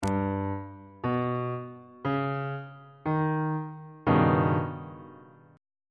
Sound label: d sharp augmented 7th 1st inversion on bass clef